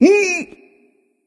nv tongue; smite; voicepack; ratatoskr

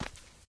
step; Smite; Xbalanque

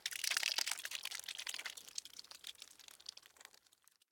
death blood; smite; medusa

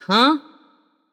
nv confused; smite; voicepack; morgan le fay

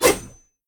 sword swoosh; Smite; Susano

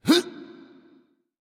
grunt; smite; he bo

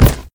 pirate run pegleg; smite; sobek

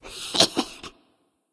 nv heh heh; smite; voicepack; ratatoskr

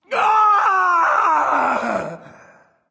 death; smite; voicepack; ullr

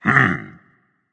thinking berserk; cuchulainn

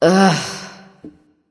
"ugh"; smite; nike

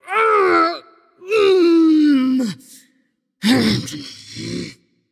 nv grumble; smite; voicepack; morgan le fay

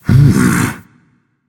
notice; smite; voicepack; guanyu

